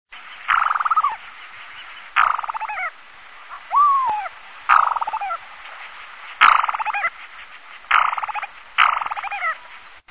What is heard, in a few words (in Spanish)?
canto ortega, aves campo